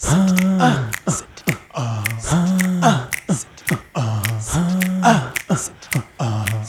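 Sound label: 108 bpm, Vocal; Spectrasonics; Supreme Beats; African Contemporary; Beg Vocal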